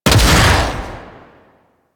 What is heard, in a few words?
Flame Spear Fire; Grineer